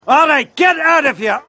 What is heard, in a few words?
chase; shrek